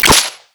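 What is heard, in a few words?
suppressor break; arccw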